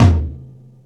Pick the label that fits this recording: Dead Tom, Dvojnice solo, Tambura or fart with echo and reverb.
Dead Tom